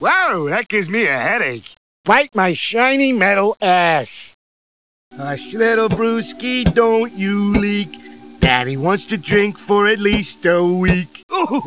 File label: chip 8bit